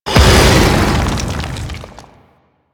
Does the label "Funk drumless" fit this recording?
No